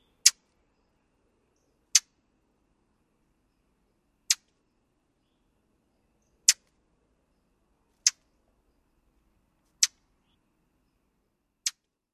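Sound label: smack calls, Brown Thrasher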